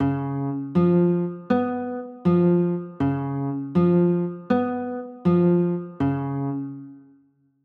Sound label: c sharp 6 9, chords